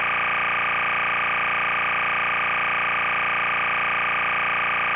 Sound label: NFM